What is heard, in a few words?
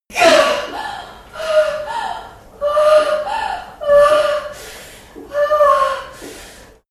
birth mother moaning